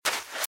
Footsteps Sand Dry Run Left; RadwinIsland

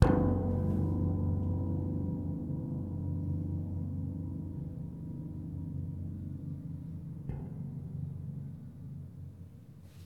suspense mute; Cinematic Metal Impacts

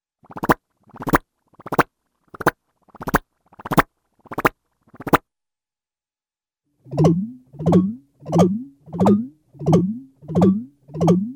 Warbley Hollow Rhythmic Bounces, cartoon; Hollywood Edge; Cartoon Trax

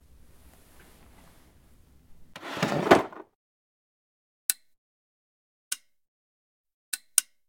flashlight sequence; blindside

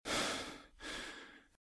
RadwinIsland

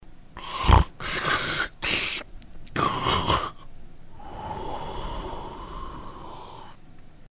Sleepy Monster; blindside

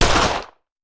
Hand Gun; Sound Ideas; Guns

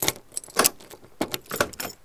Auto Door Unlock; Automobiles

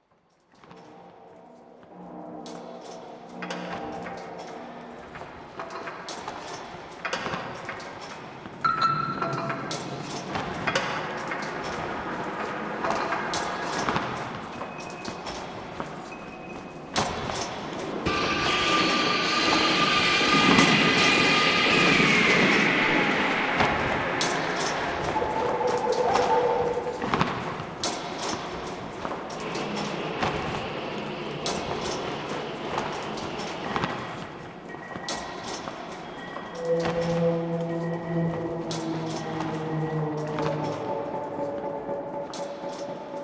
construct ambience; mono; Pitch Black - A Detective's Demise

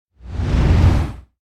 Whoosh Big Swift By Giant Strokes Low; Hollywood Edge; sound designer's toolkit v2

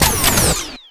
Pickup Scifi; SFX Kit

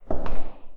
footsteps hallway; Apotheorasis

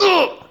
Hit; Blind Quest - The Frost Demon

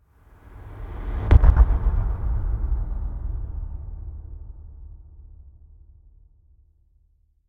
Impact Echo Deep Hits; Hollywood Edge; sound designer's toolkit v2

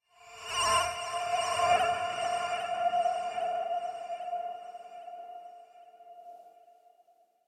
Musical Tone High Mystic Melody - Flying; Hollywood Edge; sound designer's toolkit v2

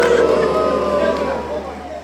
bad roll; Dice World

